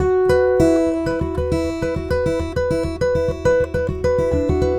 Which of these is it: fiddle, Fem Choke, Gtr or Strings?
Gtr